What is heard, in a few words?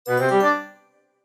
correct; Math Teaching Game